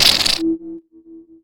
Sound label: invul impact